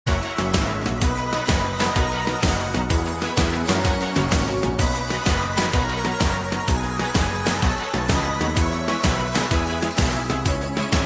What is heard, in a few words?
instrumental